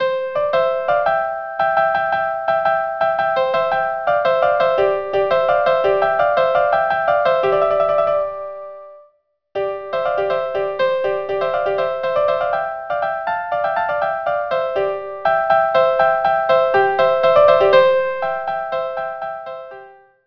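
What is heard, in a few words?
violin violin Caprice and Tambourin; Corrette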